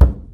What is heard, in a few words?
wood box impact soft; physics